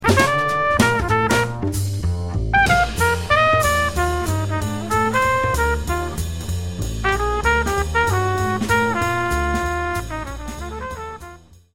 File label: minus piano